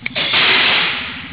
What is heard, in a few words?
bus door